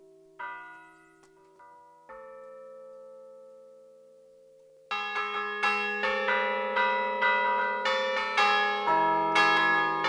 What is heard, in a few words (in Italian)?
Villa Loto, Campane; Genova; Liguria; Archivio Balma Campane Liguria; Polifonia